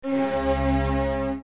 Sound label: accord